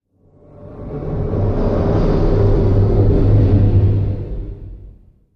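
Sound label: Metal Low Eerie Swell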